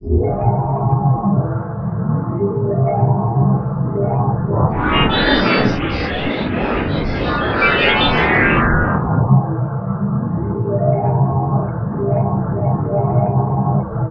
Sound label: MAGIC, Disjointed Textures; USB Soundscan vol.33; AKAI